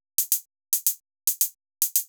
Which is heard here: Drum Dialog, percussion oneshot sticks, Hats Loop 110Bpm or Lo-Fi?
Hats Loop 110Bpm